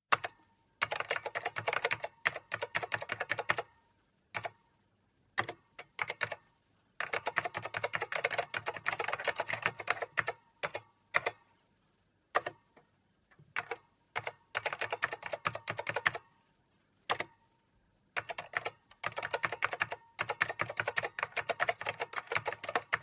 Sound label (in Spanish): escribir teclado ordenador